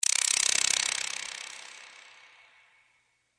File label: vibra crunchy; Weird and Interesting Hits; single hits